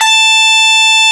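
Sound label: Overdrive Guitar A3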